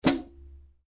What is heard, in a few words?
2002 black 15 big beat hi-hat chick, paiste